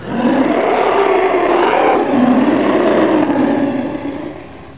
zombie base death

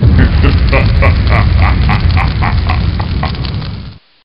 Monster start; Zm Xtreme

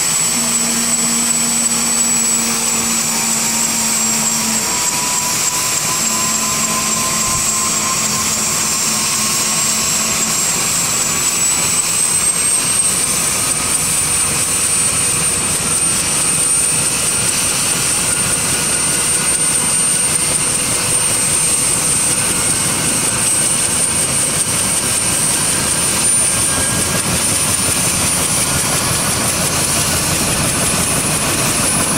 magic copter

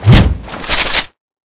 beamgun shoot

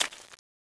zombie ghost footstep; ghostfury

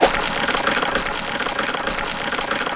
hook throw; skeleton hook

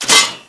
trap close; zod

